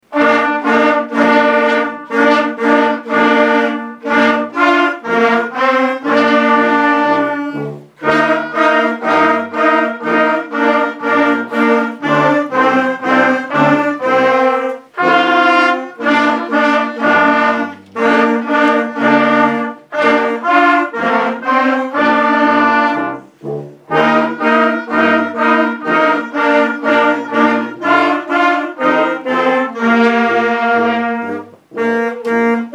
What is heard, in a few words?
jingle bells